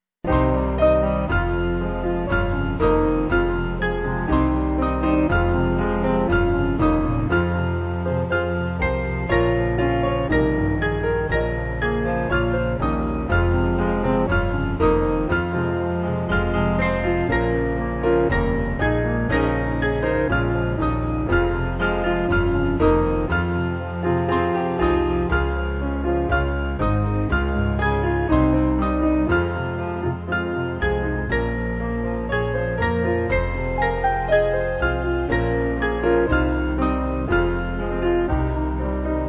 Piano

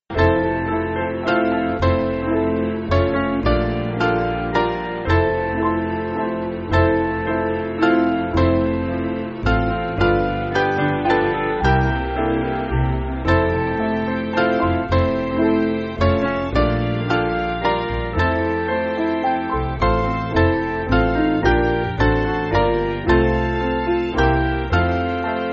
Piano

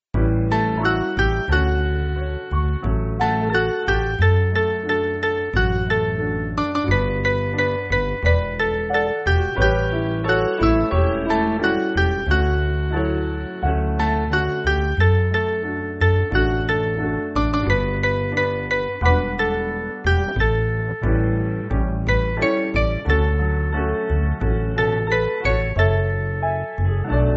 Piano

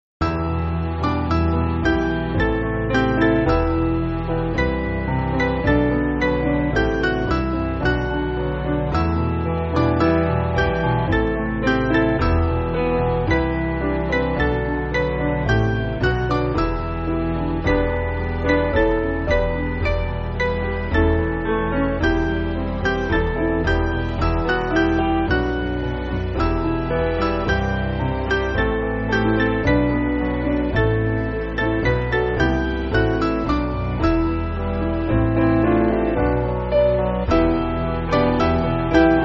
Piano

